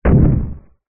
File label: fantasy giant single footstep distant heavy wood